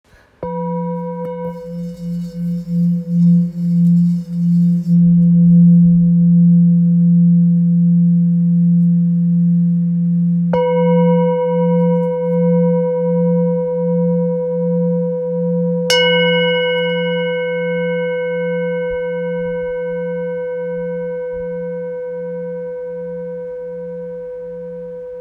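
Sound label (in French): Bol ancien fa, bols chantants tibétains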